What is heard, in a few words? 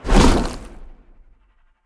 spear throw; smite; Anhur